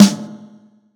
acoustic drums

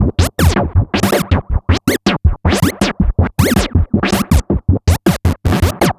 Electronica Drumloop; Drums

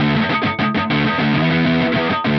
Electric Guitar, Loops; Guitars

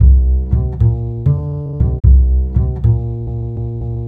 Acoustic Bass, Loops; ACID; Basses

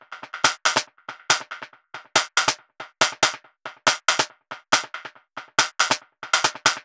Snare Loop, Drums, Dance Beats

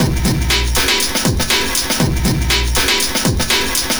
Old School Break, Drums

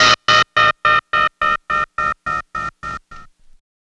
Turntables

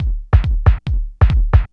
Kick Loop, Drums, Dance Beats

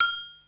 BELL, DRUMS; SoundFactory; SMPMANIA

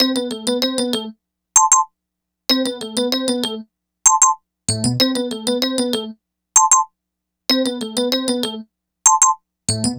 Electric Piano, Loops, Keys

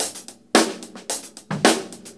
JAZLOOP; SoundFactory; DRUMLOOP

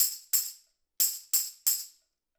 Acoustic Percussion

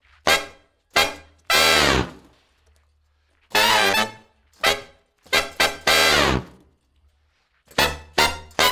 Horns Section

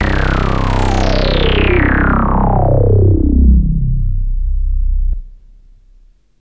low sweep; SoundFactory; SMPMANIA; EFFECTS

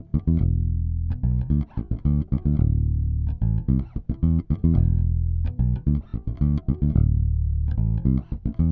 Electric Bass; Basses

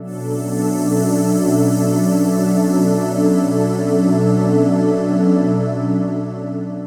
Pad, Synthesizers

